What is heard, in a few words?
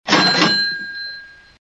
register bell dings